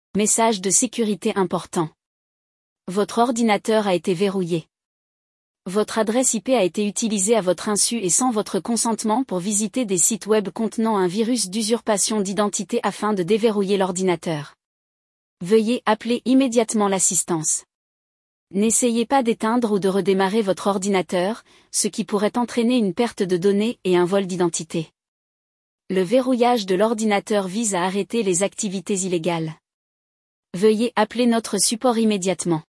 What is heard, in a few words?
Fm7 alert